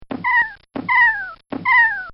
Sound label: Comical Sound Series Fighting; Funny Sounds; Bobby Cole